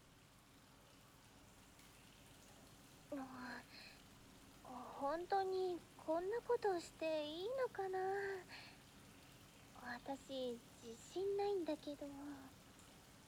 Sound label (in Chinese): 搓背